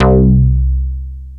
C4, HYBRIDS; East West - Global Sounds

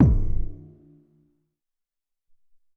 Ele Kik, Alesis SR-18

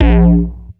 106 Bass A#, One Shot Bass

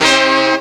HORN LICK, Hits & Brass

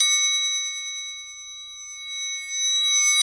EQ Perc, Percussion Mix

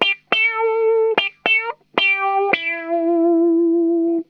PLEEEZ, Funk Guitar; 90 s Sample CDs; Zero G

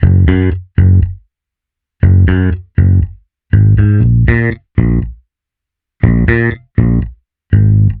Bass Guitar 120BPM Bbm; 99Sounds x Monosounds; Instrument Loops

